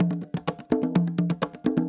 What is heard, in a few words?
Percussion Loops, Bongo & Conga; Houseworx